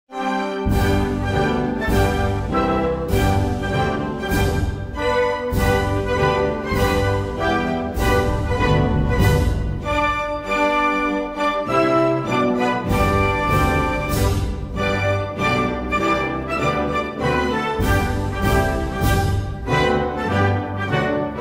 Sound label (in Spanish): Himno Nacional de Malí